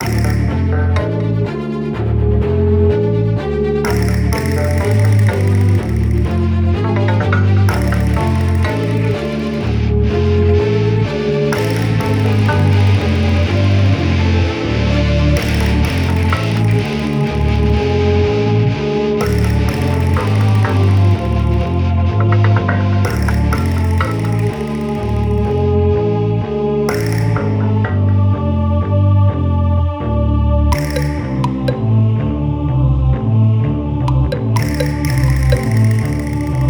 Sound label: Opening Music; 10 Second Ninja X